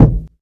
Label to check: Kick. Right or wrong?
Right